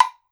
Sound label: BLOCK, Brazil Drum Perc; E-MU Producer Series Vol. 7 – Old World Instruments